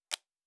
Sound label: Cards Place; Fantasy Interface; Fantasy Sounds Bundle